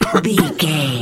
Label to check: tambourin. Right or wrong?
Wrong